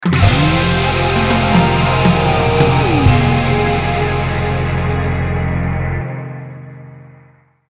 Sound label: Game Over